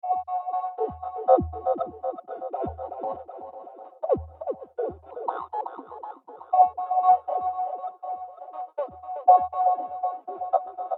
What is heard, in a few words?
Ambient Alien Atmosphere